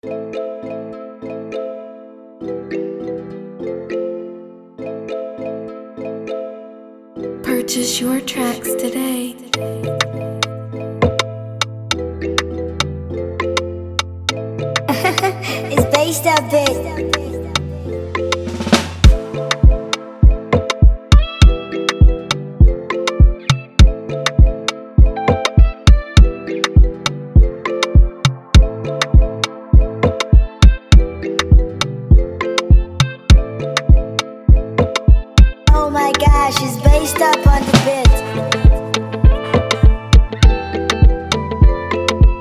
Type Beat, afrobeat instrumental

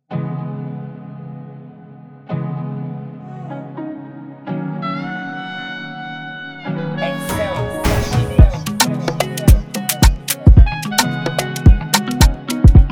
Afrobeat instrumental

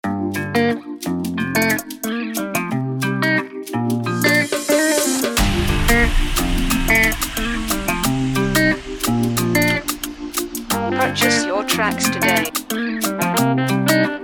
instrumental

